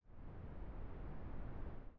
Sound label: prologue wind